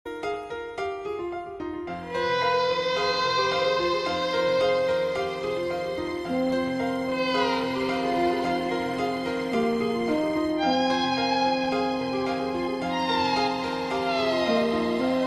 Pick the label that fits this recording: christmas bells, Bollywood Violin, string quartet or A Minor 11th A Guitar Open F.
Bollywood Violin